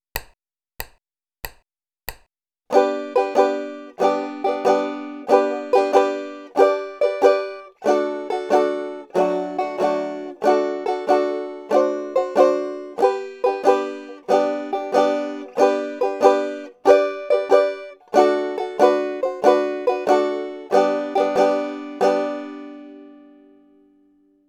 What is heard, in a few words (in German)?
shuffle rhythmus; skip to my lou